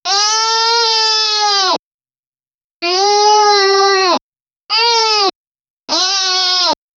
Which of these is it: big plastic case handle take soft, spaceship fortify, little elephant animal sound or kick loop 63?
little elephant animal sound